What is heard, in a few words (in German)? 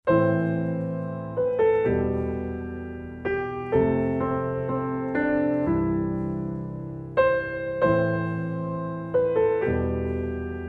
Schlaflied